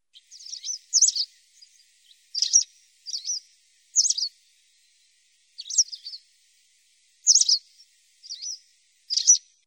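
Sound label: white wagtail voice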